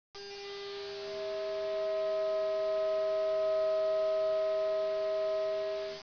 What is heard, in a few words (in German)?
zweiklang klein, multiphonic